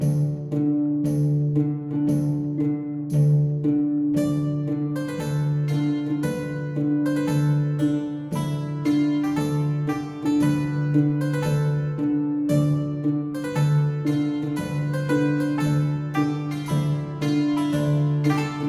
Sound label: MUSIC LOBBY MUSIC